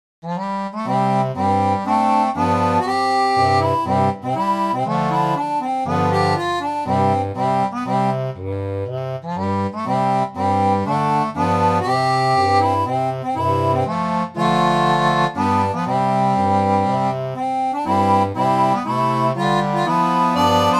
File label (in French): seraphone, orgue barbarie